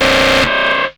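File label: RUMBLE left, Hard & Loud Techno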